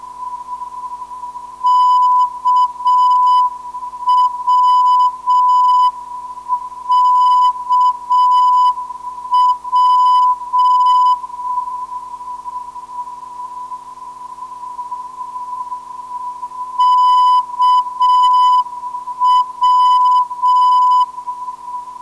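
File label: non-directional beacon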